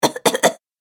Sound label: Woman Little Coughs; Recorded Voice Sound Effects